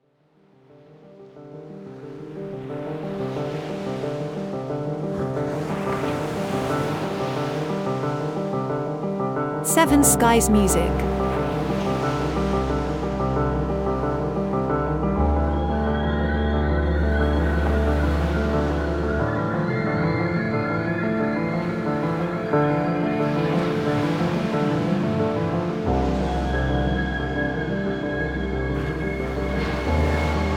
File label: piano atmosphere psychedelic melancholic